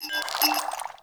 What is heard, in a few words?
Vial Foley A; Warframe; Lasrian; Lasrian Soldiers; Footsteps; Lasrian Goonadier